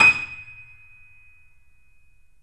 Small Pianos, 3-D Audio Collection

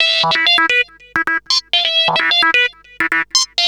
Analoop, Industrial Analog

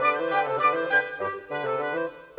Winds, Orchestral; Sonic Foundry (Sony Creative Software); Orchestral VOL-2

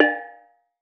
BONANG; E-MU Producer Series Vol. 7 – Old World Instruments

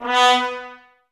BRS, Woodwind Brass Ensemble; Miroslav Vitous

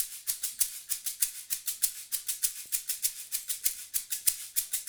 Smooth Groove Shaker, 70s Analog Funk; Sonic Foundry (Sony Creative Software); What it Is!

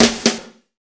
Fast Shuff, Drumscapes Roland; Northstar

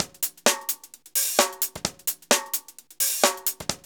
130, DRUMLOOPS

